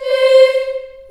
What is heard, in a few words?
C4, CHILD, Choir; CHILD IHS